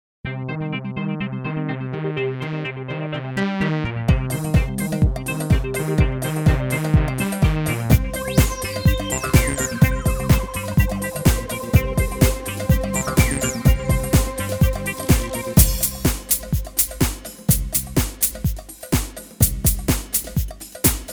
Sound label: karaoke